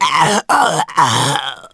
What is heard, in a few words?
death; Shadow Man